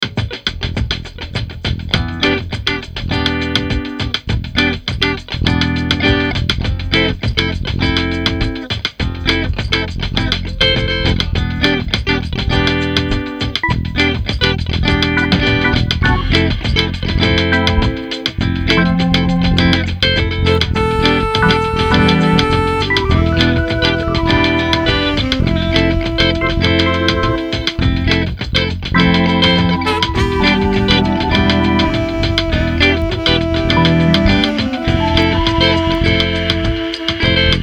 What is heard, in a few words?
Morning Bossa